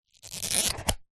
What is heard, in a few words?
foley shoe heel stiletto protector cap remove; zapsplat